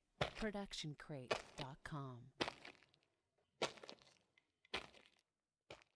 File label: loopable walking on dirty ground slow; soundscrate